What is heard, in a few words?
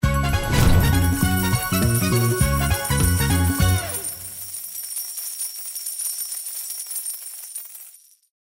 EFX JACKPOT ANIM, SLOTS; Jamaican Jackpot